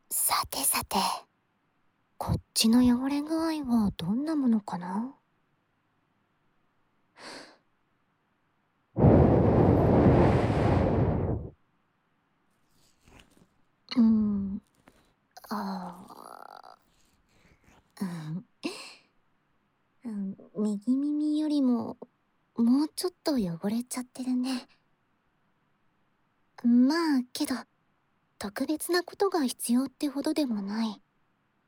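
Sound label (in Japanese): 柿渋真竹の耳かきと馬毛ブラシでの左耳耳かき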